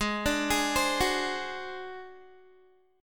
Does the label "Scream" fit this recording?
No